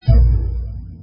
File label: sound bell; xmas